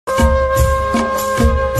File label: Flute Music